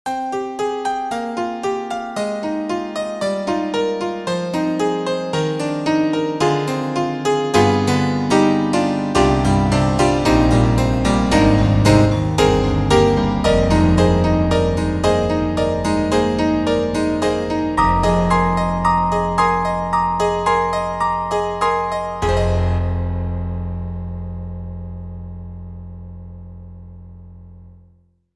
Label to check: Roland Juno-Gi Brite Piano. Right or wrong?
Right